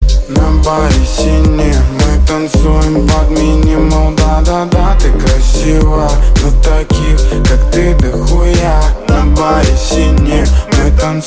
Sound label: Minimal